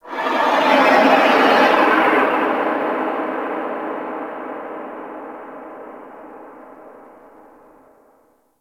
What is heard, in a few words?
Moon Creature Large D; Ambience; Warframe; Orokin Moon